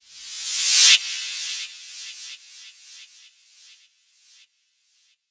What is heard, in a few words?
Reverse Fx; musicradar